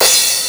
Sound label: dancemusic crash